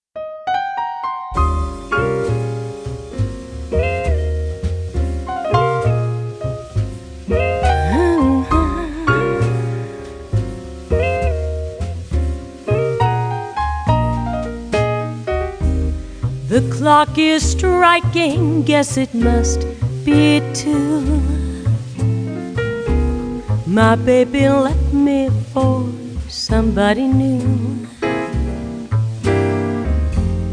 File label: Old Piano Plays The Blues